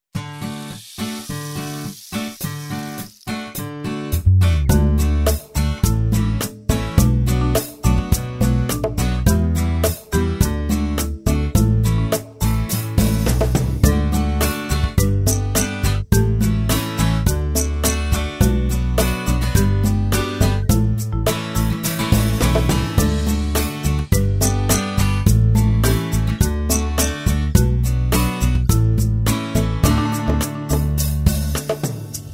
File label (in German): instrumental refrain mit strophen